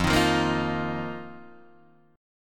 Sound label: F 7th Suspended 4th F, Guitar, DADGAD